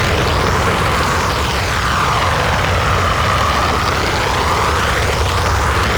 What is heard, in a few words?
Void Dragon Orb Projectile Loop; Enemies; Abilities; Warframe; Duviri